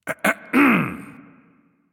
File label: clear throat; smite; ares